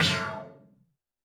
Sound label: Roland TD-20 Percussion